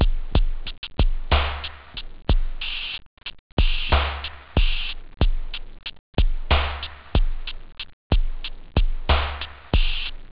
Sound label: etronic, instruments wave loop house